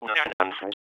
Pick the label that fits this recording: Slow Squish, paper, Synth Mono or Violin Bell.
paper